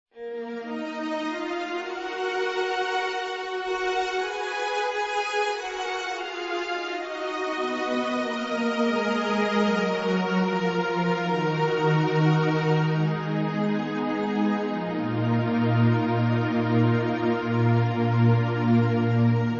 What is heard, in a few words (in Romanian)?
Grup vocal mixt, colinde